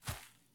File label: run grass low; Escape from Tarkov